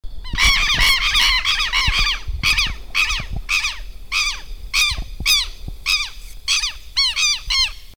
Vanellus chilensis flush

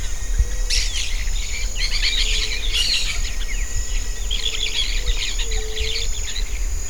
Pyrrhura frontalis perched

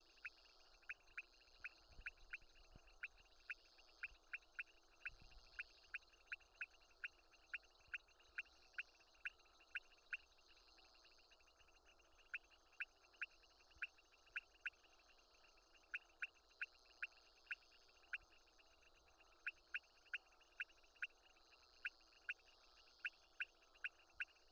Leptodactylus podicipinus

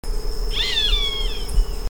Rupornis magnirostris

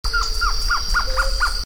Trogon surrucura monotone

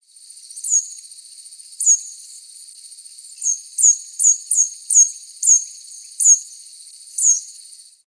Basileuterus leucoblepharus calls; Santa Ines

